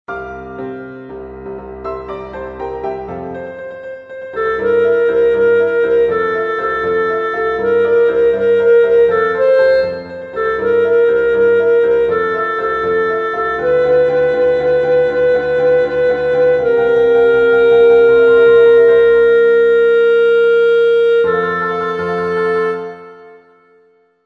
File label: Alto; gondoliers; So goodbye, cachuca